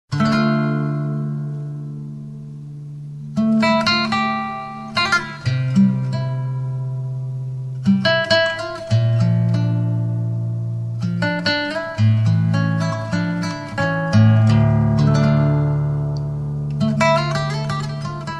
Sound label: classical guitar